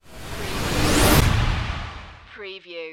Whoosh; Sound Effects Bible

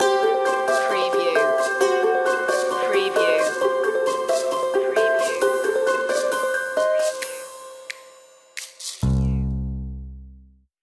the quirky traveller stinger

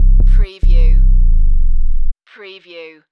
Techno-Trance Sub Bass Loop in C 142 BPM

